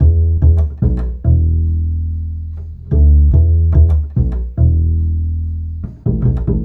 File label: Acoustic Bass